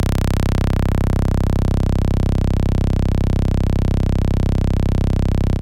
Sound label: Dist Saw C1, Moog Voyager; Moog Voyager Sounds; VES1 Dist Saw